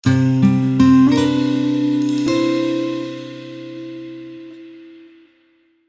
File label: guitar harmony logo